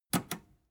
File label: metal button robust click fast